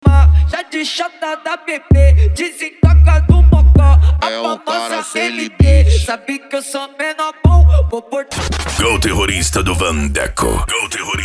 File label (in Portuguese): dance e funk mega bass